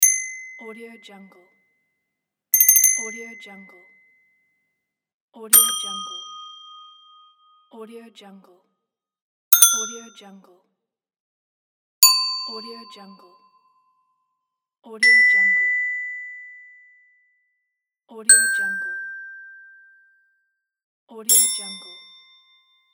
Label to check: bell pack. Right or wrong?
Right